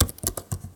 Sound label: Keyboard Presses; Realme 8